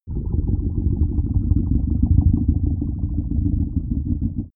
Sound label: digital idle smooth gurgle; atmosphaeren